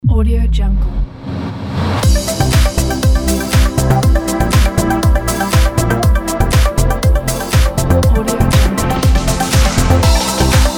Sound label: corporate logo